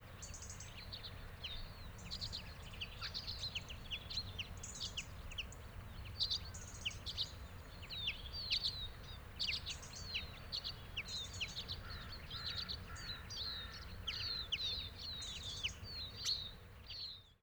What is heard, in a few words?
Lesser Redpoll Flight Calls Of Single Migrant